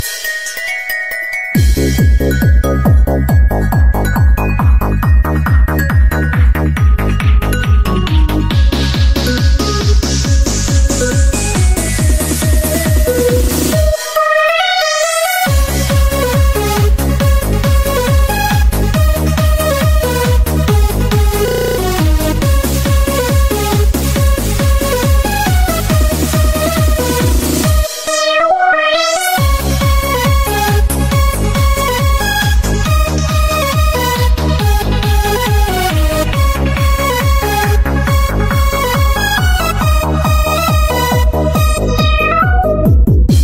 Khong Loi, DJ; Tap The Duc Buoi Sang

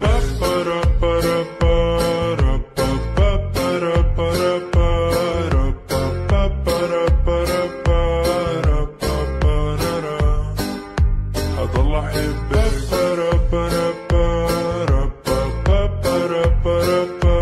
Remix Nhac Thai Lan

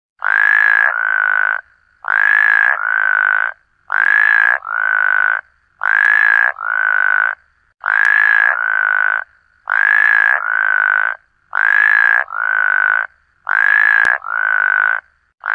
tiếng ếch kêu

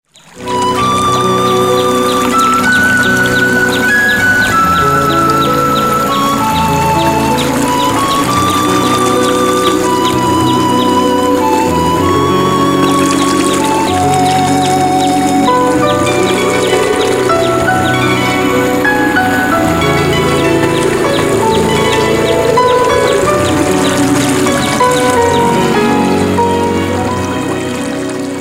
nhac keu nuoc chay chim hot

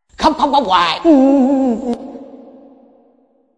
khoc khoc khoc hoai yahoo messenger

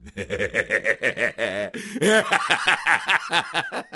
Giong cuoi quai dan kinh di

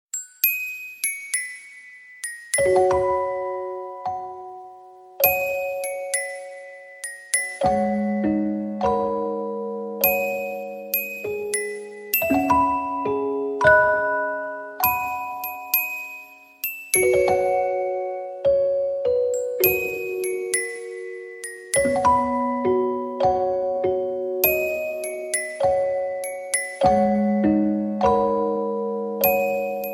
nhac tin nhan de thuong chuong gio

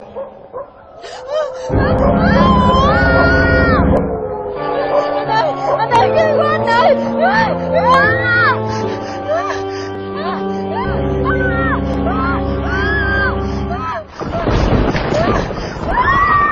tiếng hú kinh người

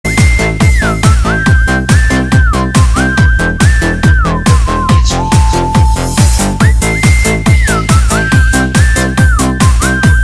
thoi sao dj

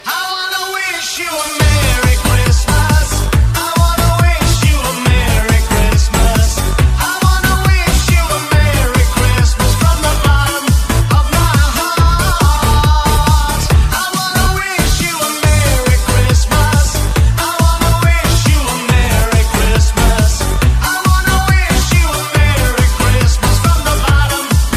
Nhac Chuong Noel Remix

